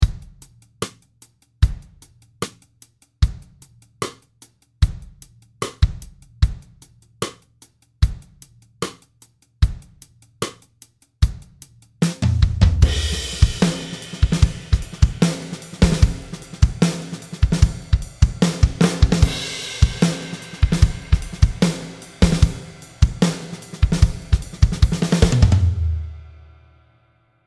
Snare Reverb; Exponential Audio Phoenix Verb

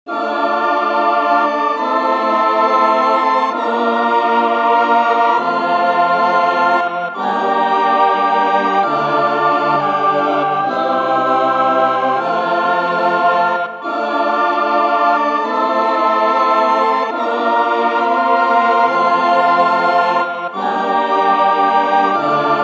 Mellotron Micro 8 Voice Choir

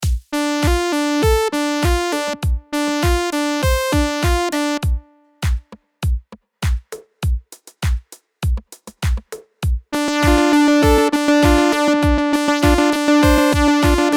arp variation; hologram electronics microcosm

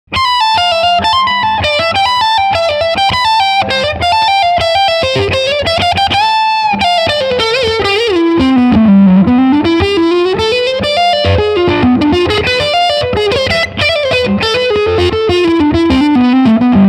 Mid Gain Lead

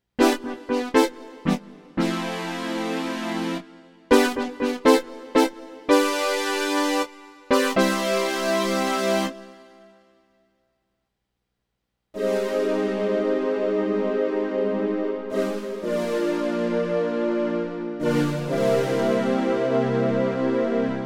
Synth

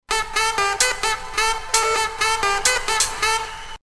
Hercules DJ Sample

